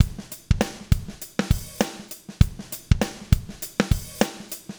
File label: API 2500 hard; Liquid Mix